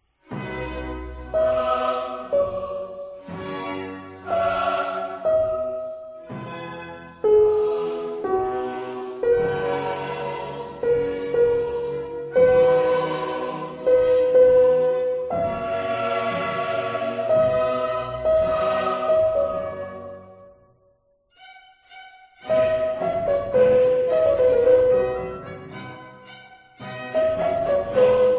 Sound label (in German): Sanctus Sopran; spatzenmesse